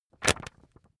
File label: magazine skim hard